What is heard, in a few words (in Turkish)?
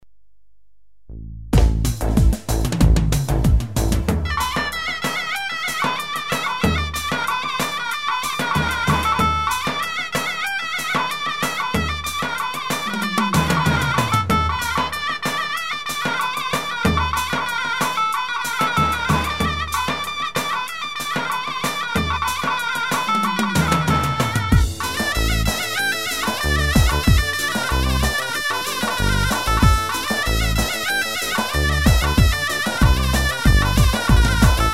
sx halay, sx3000 ritim; yeni ritim demo